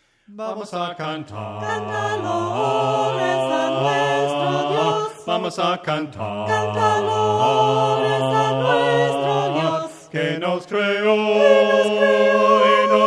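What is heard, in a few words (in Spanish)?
VAMOS A CANTAR VOCES, himnos a capella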